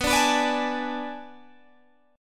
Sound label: B Minor 13th B down Guitar Open G